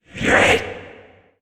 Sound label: Vocal Attack; Warframe; PriestQuest; PossessedRedVeil